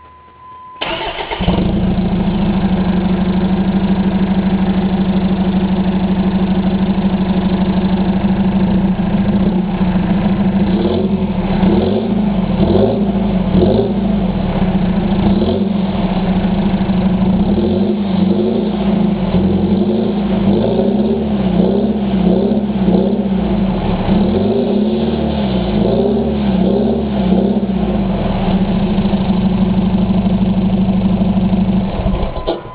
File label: exhaust, magnaflow